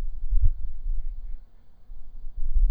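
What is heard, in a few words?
mallard female, night flight calls in beijing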